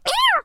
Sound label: scared